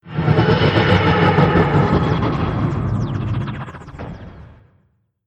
tardis demat fail; p00gie

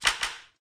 buttons; p00gie

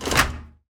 monitor release; p00gie

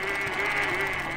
steeringmech loop; p00gie

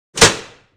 red lever on; p00gie

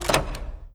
colored lever, tardis; p00gie

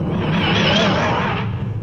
flight loop broken, tardis; p00gie

